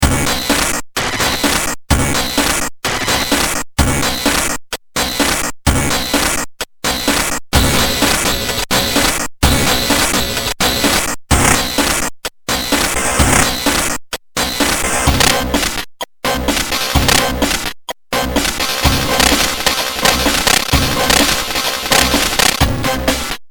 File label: rx8 demo, drum